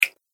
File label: Water Button, button sound effects; multimedia